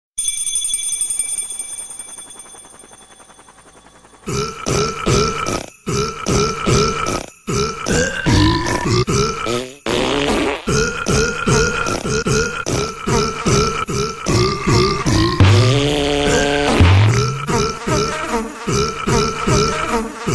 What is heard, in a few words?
christmas fart; ringtones